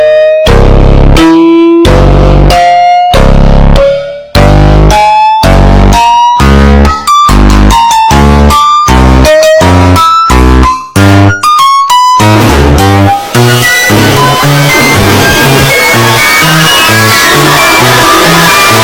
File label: countdown; freddy